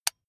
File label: electric button drill direction switch lock heavy